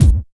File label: mid heavy kick drum sample c key, bass drum single shot tuned to the C note